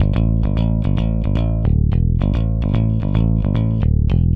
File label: Rock, Bass; Bass X